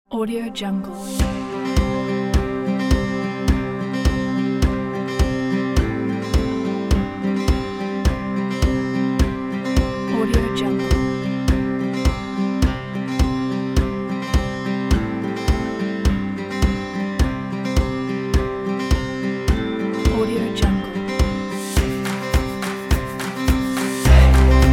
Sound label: indie acoustic upbeat